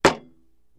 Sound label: Axe Hits Shield 02 Lig